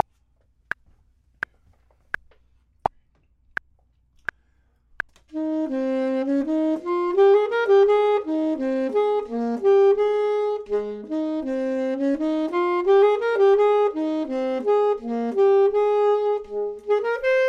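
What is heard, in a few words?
top, Saxophone and Clarinet; voxman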